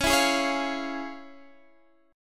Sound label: F Minor Major 7th Sharp 5th, C sharp